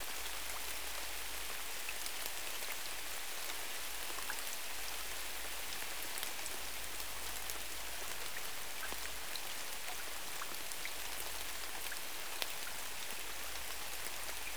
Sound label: amb rainforest rain; chicory a colorful tale; ps4